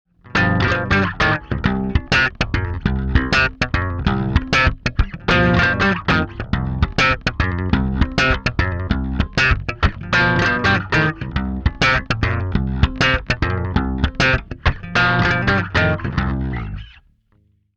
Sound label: Sansamp hiloboost Char right logain